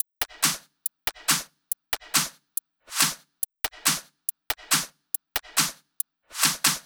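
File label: drum Loop 140BPM hi-hat Clap; Vengeance - Essential Clubsounds Vol.5; VEC5 Drumloops; VEC5 Multi Loops 140BPM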